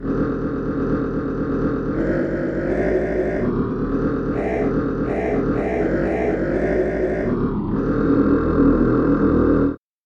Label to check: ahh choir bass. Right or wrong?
Right